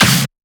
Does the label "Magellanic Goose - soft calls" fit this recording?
No